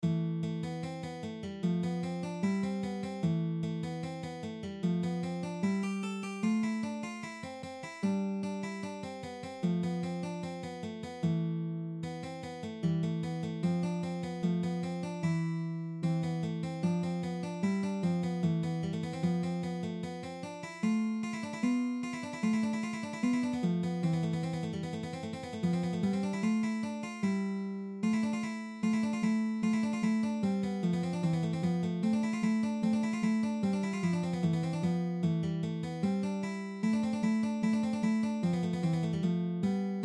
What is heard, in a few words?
150bpm